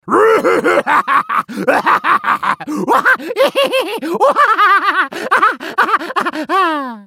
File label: hyena laugh; Warwick; Character Voices - English